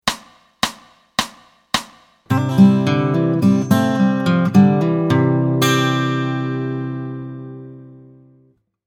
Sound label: Open Chords With Fingers C